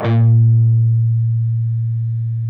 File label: PRS lead, guitars; Spectrasonics; Hans Zimmer Guitars VOL-1